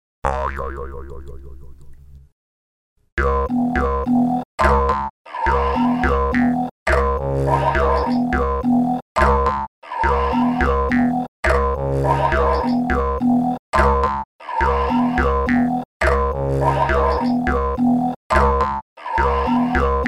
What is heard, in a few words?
Mystery pattern; NI Kontakt 3; Jaw Harp + vocoder